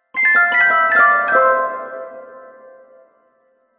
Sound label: Elf Harp Magical Gliss; Magic Sound Effects